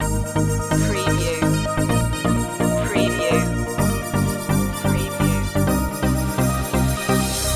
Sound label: funky digits loop